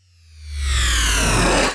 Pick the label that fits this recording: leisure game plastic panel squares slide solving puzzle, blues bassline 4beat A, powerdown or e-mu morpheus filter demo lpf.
powerdown